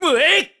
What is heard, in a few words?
Lift Heavy; Link lifting a heavy object; Skyward Sword; zelda